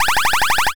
fast forward

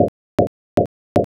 fs carpet

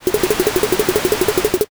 carriage

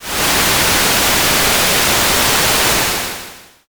rain

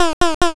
duck

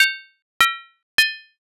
dishes

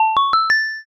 asia xylo

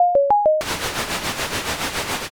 fax

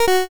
cant buy

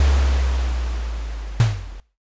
drawbridge

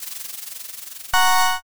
answer roll

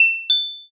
shop enter

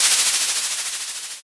tent

